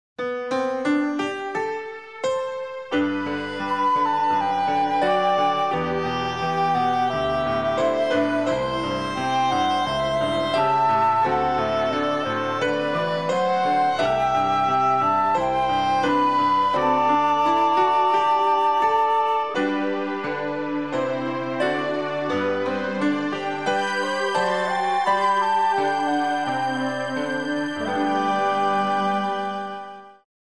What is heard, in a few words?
Easy Listening & Classical; Sound Ideas